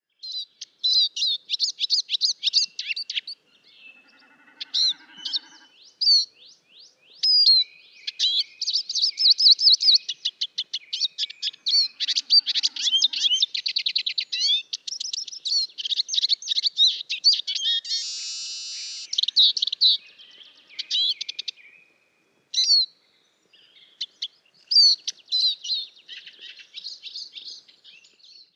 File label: Alksninukas - Eurasian Siskin - Spinus spinus